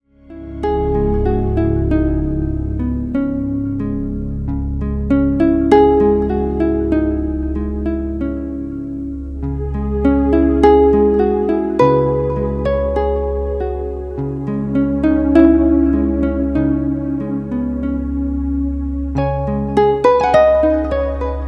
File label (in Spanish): musiquita